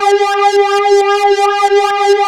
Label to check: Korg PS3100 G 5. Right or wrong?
Right